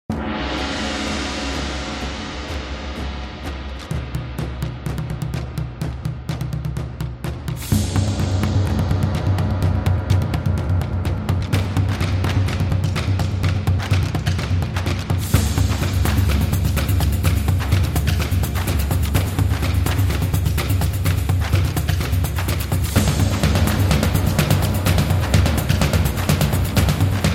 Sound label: Japan Traditional, battle drums